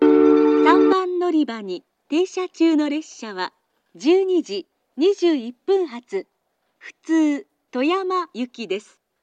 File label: takaoka local toyama, station